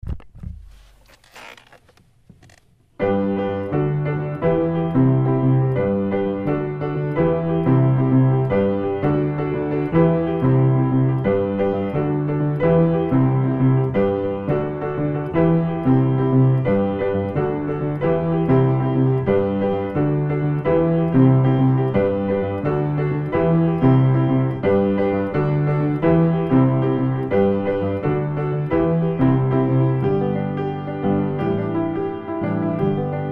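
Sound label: accompaniment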